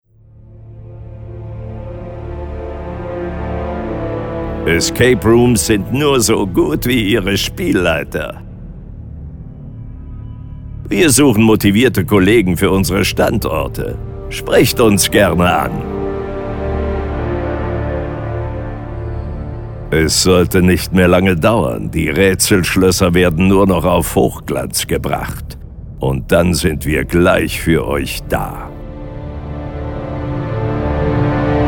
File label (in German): Warteschleife